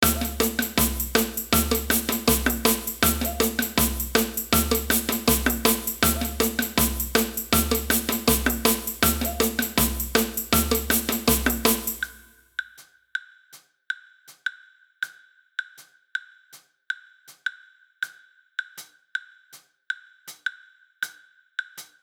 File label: block, samba